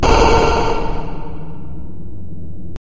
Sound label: 8 bit explosion